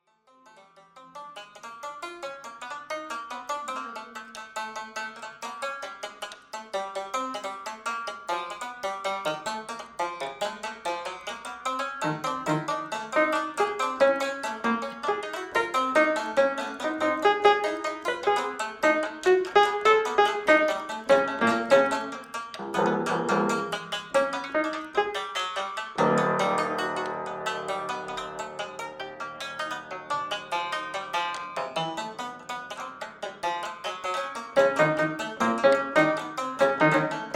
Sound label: Piano Improvisation